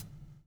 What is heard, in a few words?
BUBL, Backbeat; Spectrasonics - Backbeat